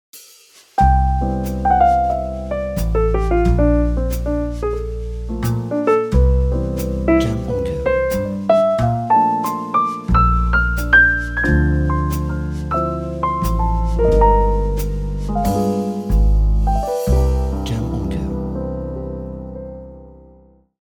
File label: Slowly Jazz Intro